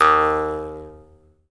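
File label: Jaw Harps, Pitched Percussion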